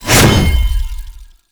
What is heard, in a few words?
Sword Swipe; Warframe; Ballas Sword; Weapons Melee; Whooshes